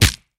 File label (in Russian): udar